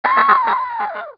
elephant

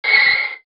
tyre screech

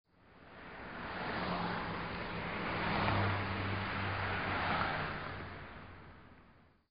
Traffic

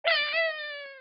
baby crying

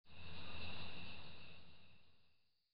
crickets fade out

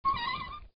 wheel squeal

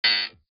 error

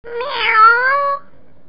meow

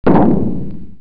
explosion good deep

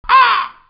crow

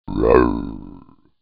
bear growl

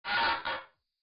crate open

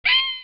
scream

